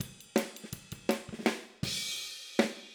Fill Hi-Hat, Drums, 162 BPM; Drums from the Big Room The Mixes; Take Your Toms Off

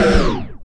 Scratch; Roland BK-5

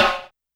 Snare Break, YAMAHA RM1X

